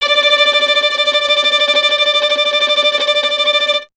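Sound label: string violin tremolo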